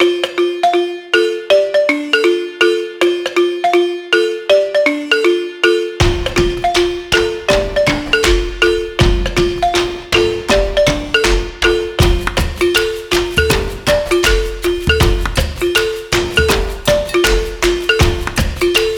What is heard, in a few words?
African Percussion